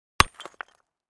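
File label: Cinder Block Hit Sledge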